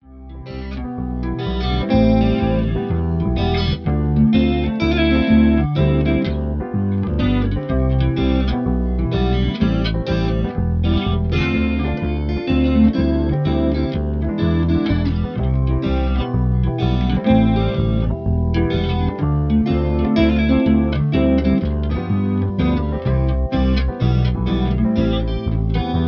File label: Beach Shuffle